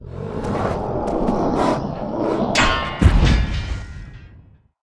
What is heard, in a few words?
death, monster; lake graymino